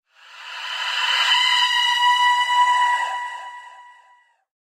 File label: Scream